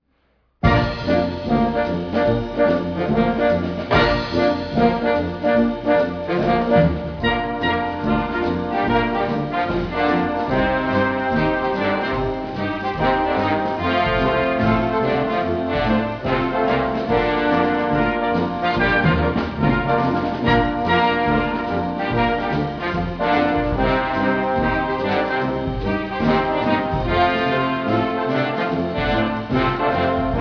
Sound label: Jive for Brass